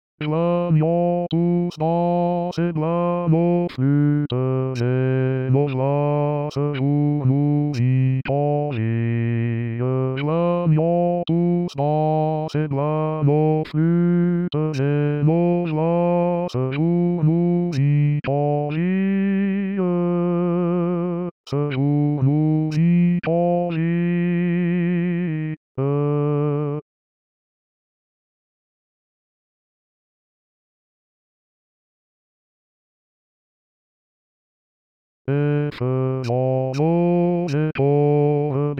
joignons flutes sib basse; 2022 st jean

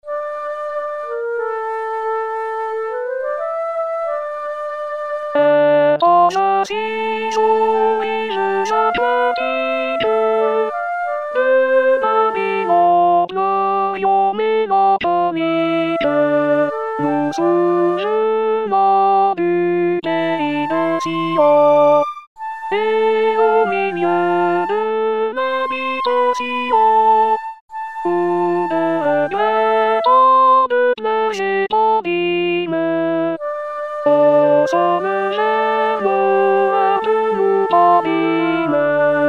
alto

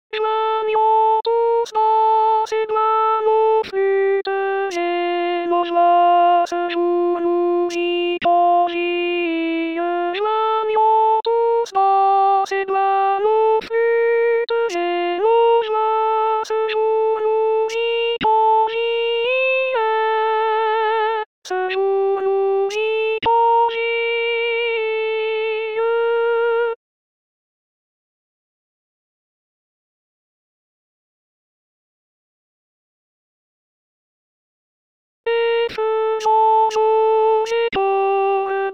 joignons flutes sib alto; 2022 st jean

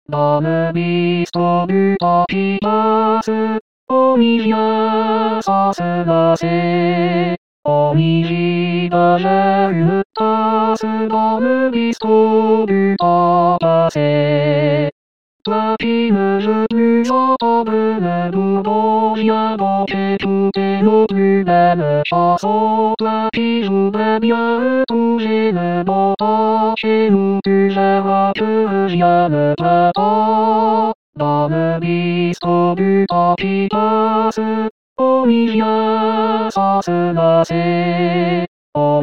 le bistrot; 2023 st jean

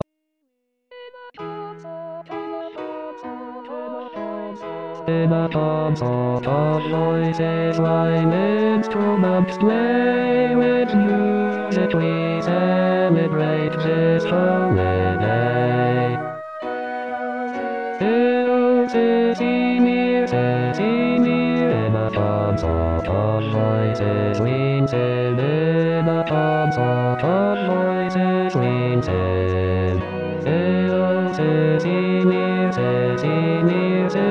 basse, baroque; 2020 baroque

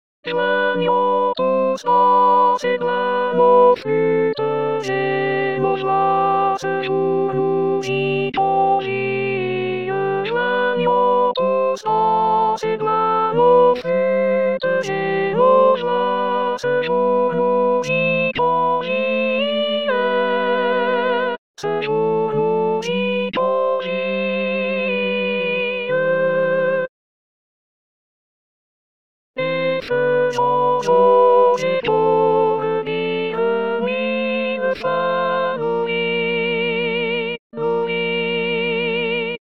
joignons flutes do alto; 2020 st jean

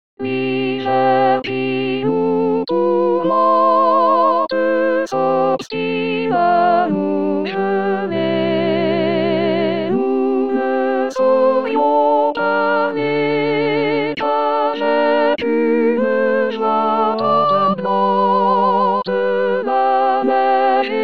hiver soprano; 2023 st jean

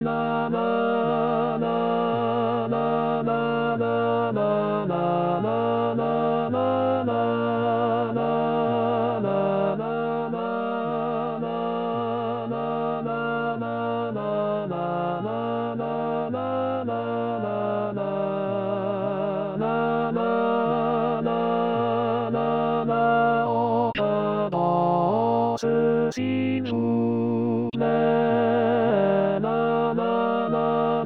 bourgeois gentilhom tenor; 2020 st jean

